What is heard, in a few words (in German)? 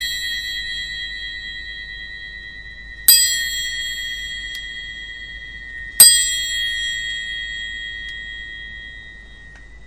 Fingerzimbel Messing